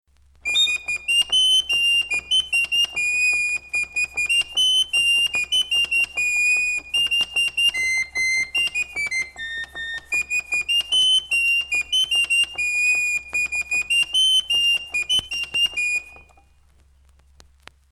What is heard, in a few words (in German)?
Vogelorgel